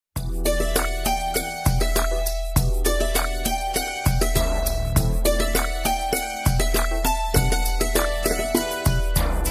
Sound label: Instru